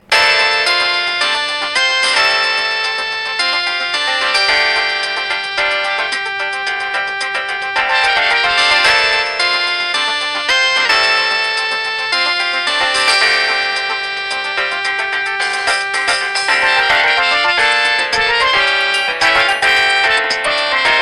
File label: Samsung Song